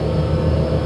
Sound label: industria